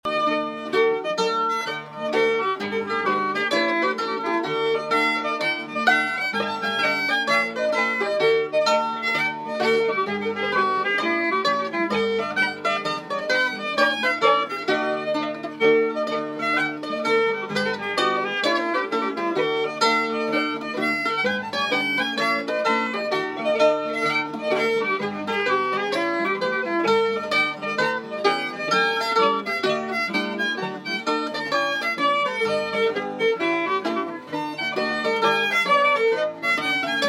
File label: Hornpipe